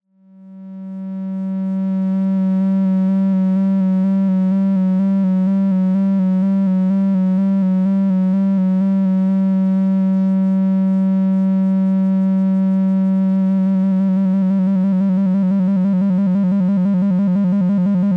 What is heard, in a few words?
Moonwalker Skew CV LFO slow to Audio; Metabolic Devices